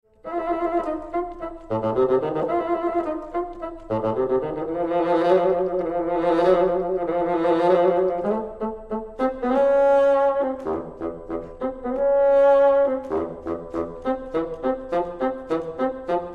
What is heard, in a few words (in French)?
basson